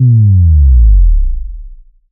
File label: SubDrop Bold Clean